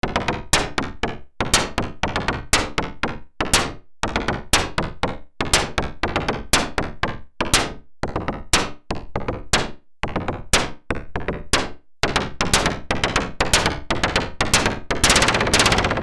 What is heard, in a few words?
noise